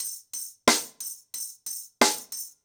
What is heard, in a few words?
Nuclear 90BPM, ACOUSTIC DRUMS